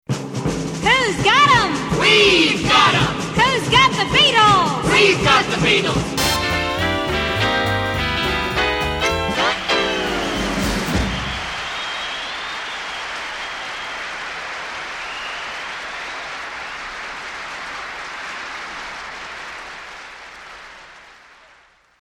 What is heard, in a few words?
beat